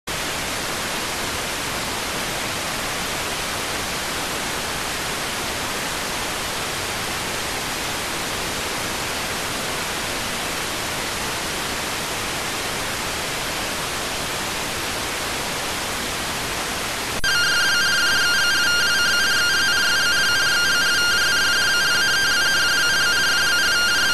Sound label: rtty rx